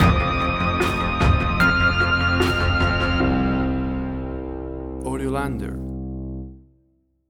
Big Atmospheric Tension Stinger

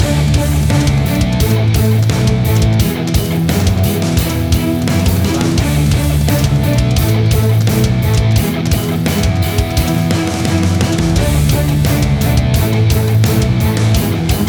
Big Action Gothic Metal

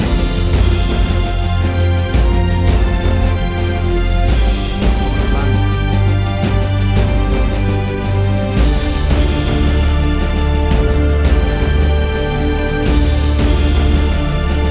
Big Powerful Dramatic Action Superhero Music

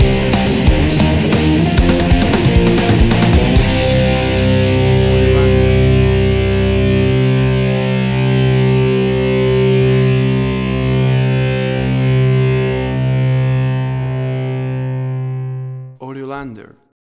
Big Dirty Rock Stinger

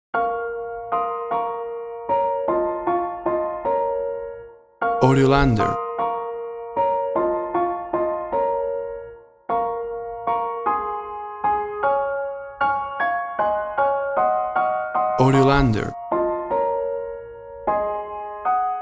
We Three Kings Piano Version

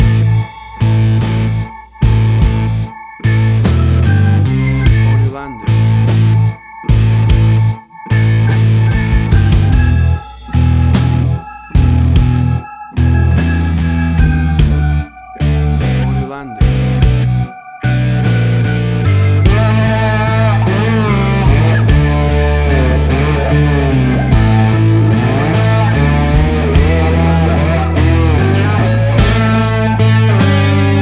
Blues Bar Angst

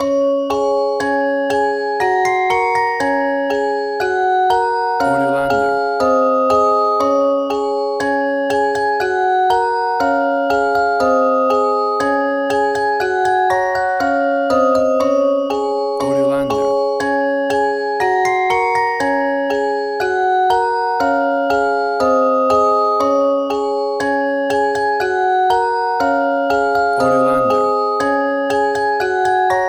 Baa Baa Black Sheep Music Box

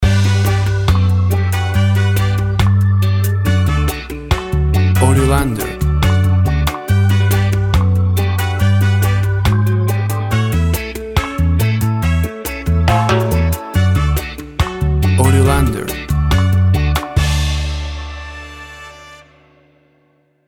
Virgin Islands Reggae Music

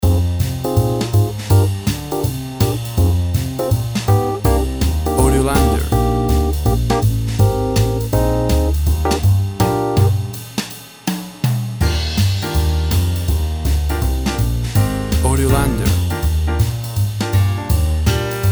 Toe Swinging Jazz Music

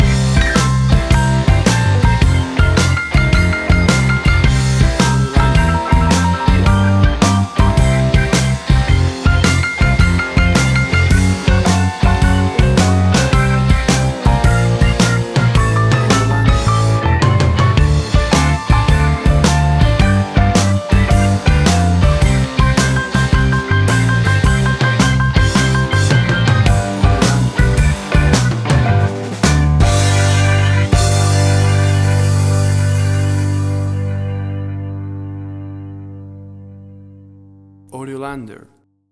Bluesy Country Rocking Music

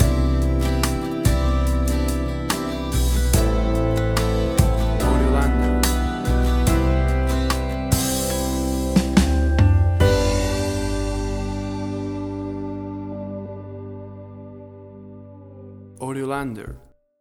Chilled Out Nashville Pop

